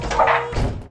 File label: autocannon loader; Weapons; EK modern; Barotrauma